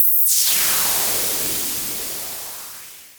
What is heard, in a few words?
FX, Dual Percussion Synthesiser; Klone Dual-Percussion-Synthesiser